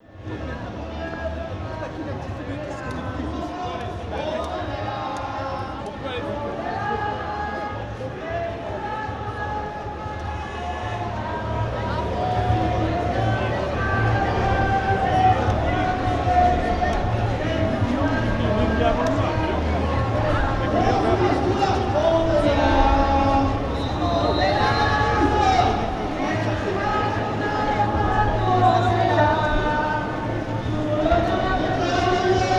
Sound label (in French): Chant On Est La; Manif Rouen